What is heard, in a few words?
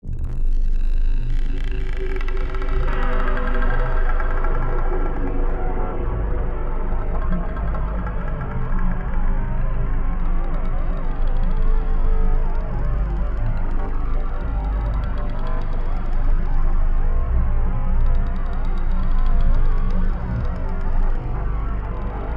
PAD The Clattering; SmartSoundFX; Dark Textures